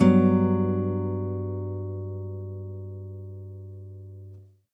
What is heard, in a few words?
crd Gmaj7